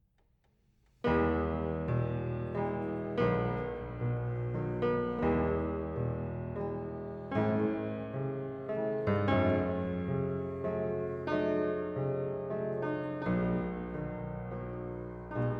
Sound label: Preludes Op 28 - No 9 in e major, Piano; Chopin Preludes; Frederic Chopin